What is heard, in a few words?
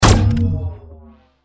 weapon; Exoskeleton; Submachine Gun